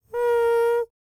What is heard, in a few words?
HUMAN TRPT, Jazz FX; Spectrasonics; Vocal Planet